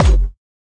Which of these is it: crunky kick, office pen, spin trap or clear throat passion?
crunky kick